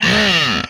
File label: Hit, Vocal; retro arcade